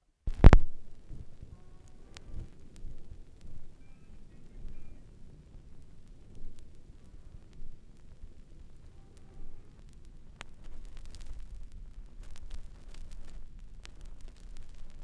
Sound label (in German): schallplattengeraeusche